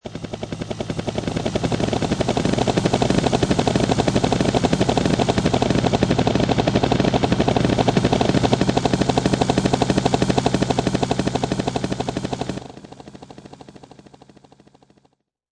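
Helicopters Multiple, High quality stereo recording of multiple helicopters hovering overhead; Vehicles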